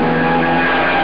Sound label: fire; dogwar